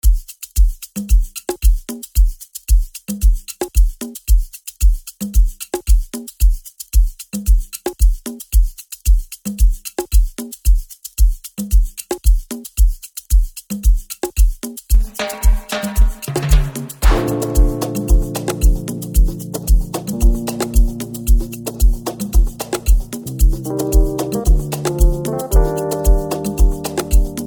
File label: Sax